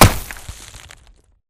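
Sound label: Bullet Light Ballistic Dirt impact; Titanfall 2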